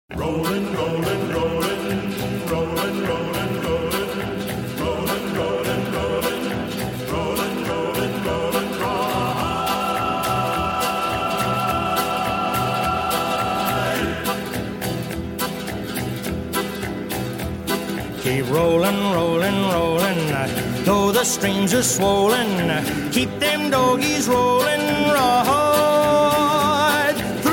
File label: Ringtone